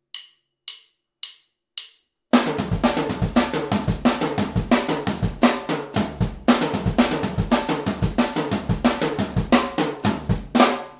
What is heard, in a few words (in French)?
break passe partout, batteur